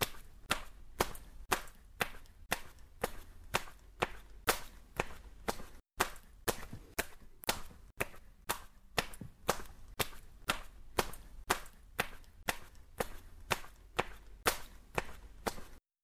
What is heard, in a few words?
Smack; Lewd SFX